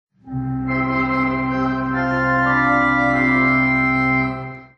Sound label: 4 flutes, organ; organ music programmes